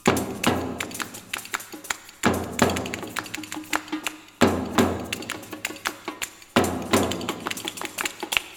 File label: Spanish Drums